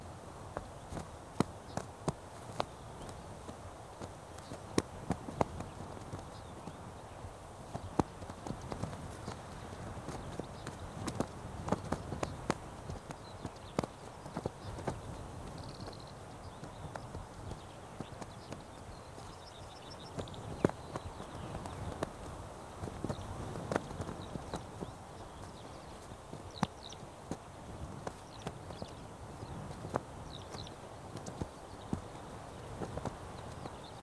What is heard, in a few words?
Flag and wind